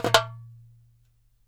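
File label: DARABKA roll, Mediteranean Traditions percs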